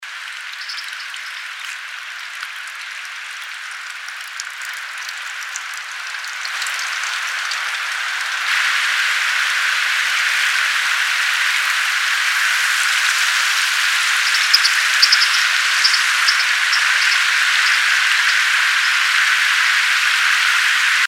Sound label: American Dipper, Cinclus mexicanus